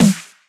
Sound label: Heavy Snare